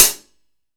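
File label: closed hi hat, 14 inch old hi hat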